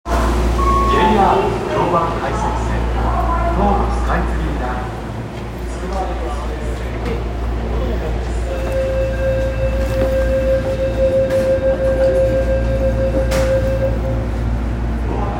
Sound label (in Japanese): 千代田線北千住北, train sounds